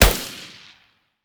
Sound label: groza shoot, weapon